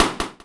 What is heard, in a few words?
Timpani with Echo